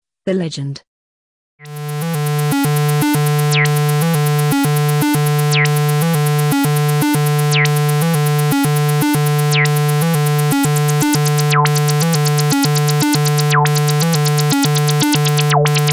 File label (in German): Resonanzvergleich